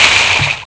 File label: shot